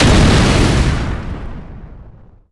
Grenade; MilkChoco

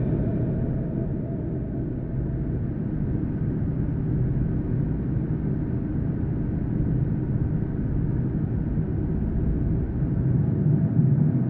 dungeon ambient; Hellsplit Arena

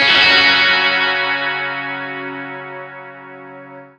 Lake Clean F sharp 3; torchlight iii; ps4

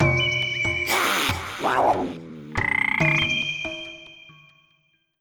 basic Mood Bosque

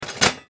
Lazy Grab; F.A.M.P.S

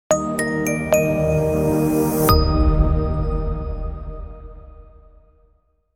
Win; Neoverse

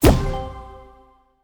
Breakable Reward Hit; Ellen's Garden Restoration; Android

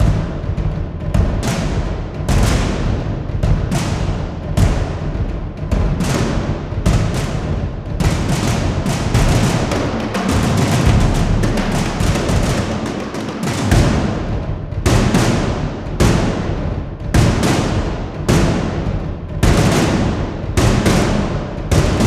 Hear the Drums; Thea: The Awakening; Return of the Giants

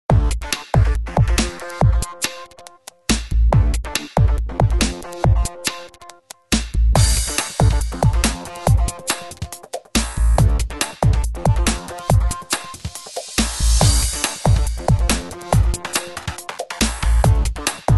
Bonus; Iron Fist Boxing

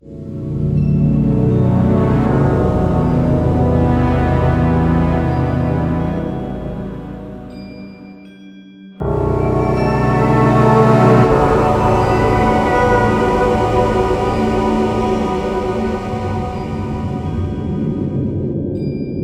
Merchant Room Dark; Dreamscaper; xbox series xs

